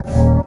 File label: Eb STAB, funky